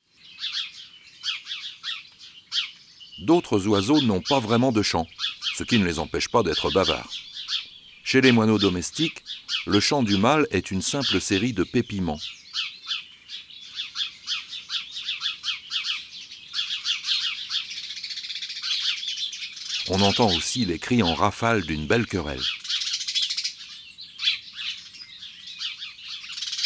Moineau domestique, oiseaux des jardins

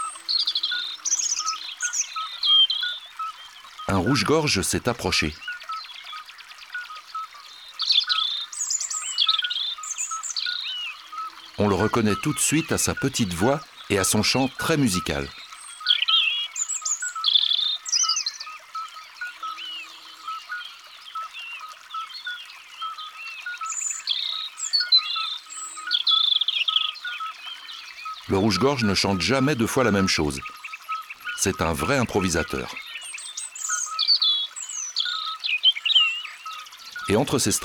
Rougegorge familier; abc de la nature

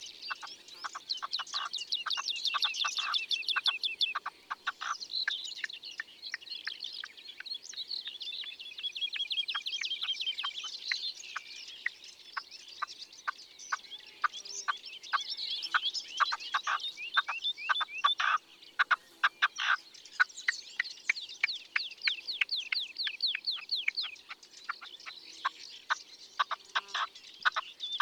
Perdrix rouge ABC des chants d'oiseaux

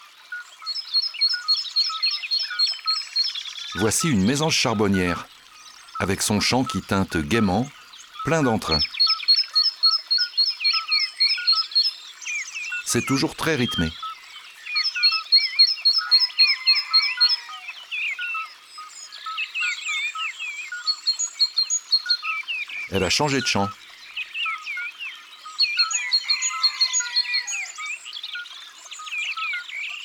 Mesange charbonniere; abc de la nature

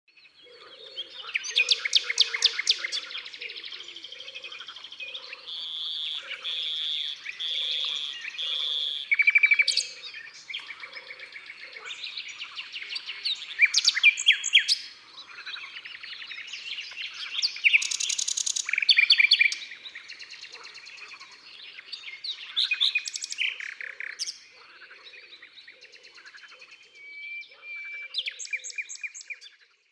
Rossignol philomèle; Concerts Naturels de la Drôme; Studio les Trois Becs

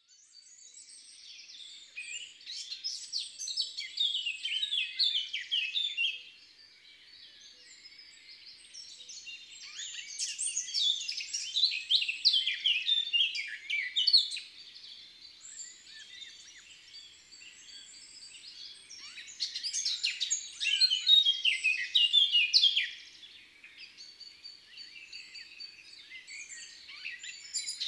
Fauvette a tete noire Chants d'oiseaux des bois et des forets

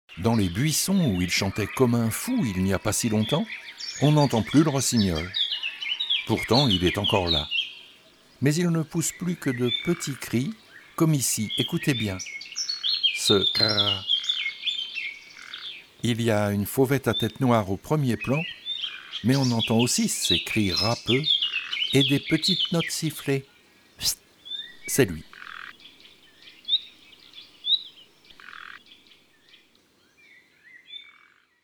Ete Le Rossignol philomele

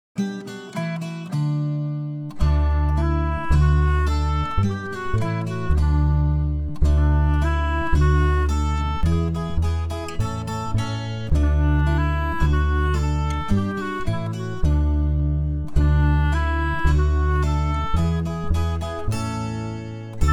Les escargots INSTRU

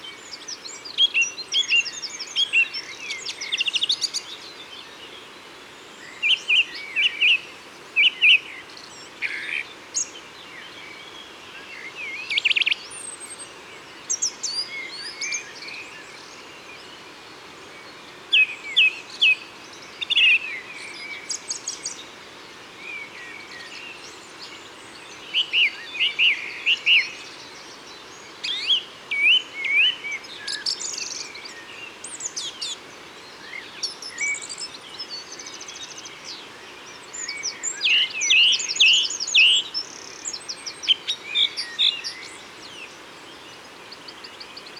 Grive musicienne, Isere; Concerts naturels de l'Isere; Studio Les Trois Becs

